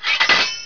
Sword Clang; Castles - Siege and Conquest

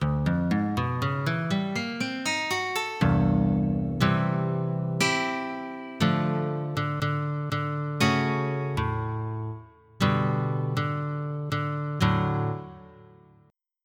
Nylon Guitar

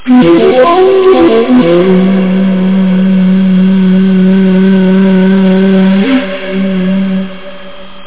Pan Flute

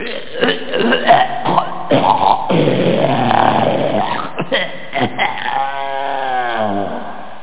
strangulation

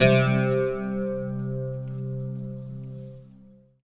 SITAR ETHNO 125; eJay; Guitar

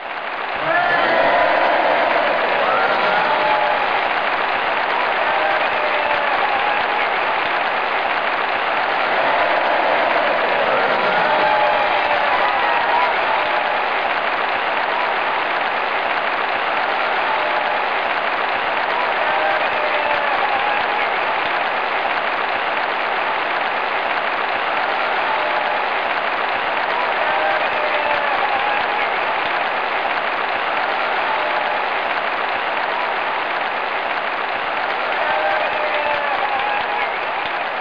General Cheer; Cycling Manager

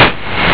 Ignite

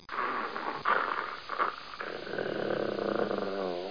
GROWL; 10,000 Graphics Pack Volume 1; Media Graphics International

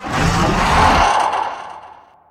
mine cart creak; darwinia demo

